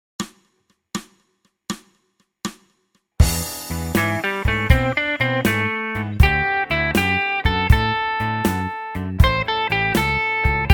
blues

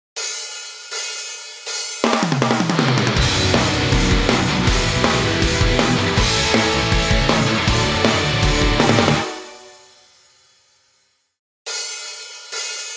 karaoke

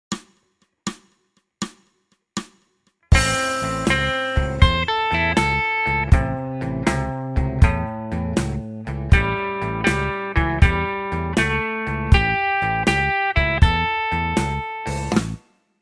blues

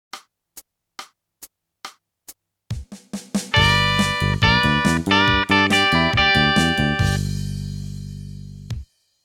country

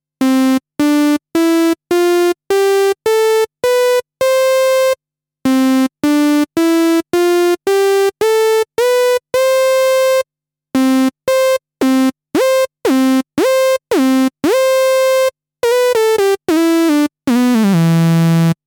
Porta

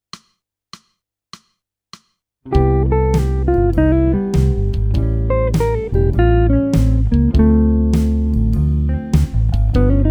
jazz

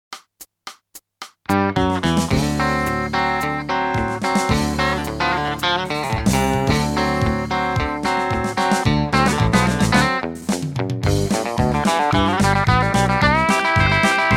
country

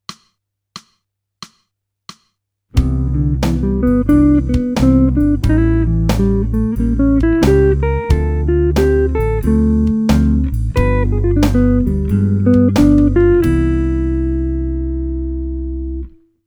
jazz

